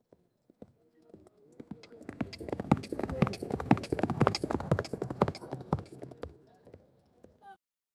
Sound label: thousands of footsteps on